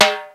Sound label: Timbale H, Percussion; Akai XR-10; Drum Machines